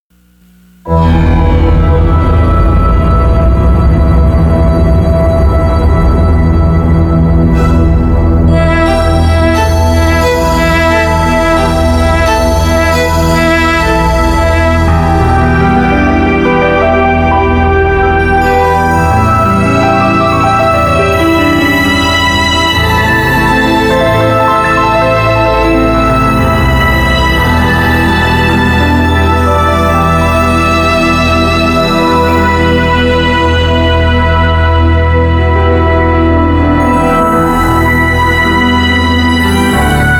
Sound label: full synth version instrumental